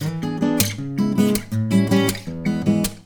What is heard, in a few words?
Ac Gtr Fret Noise No Auto EQ